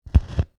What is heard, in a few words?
Vinyl Needle drop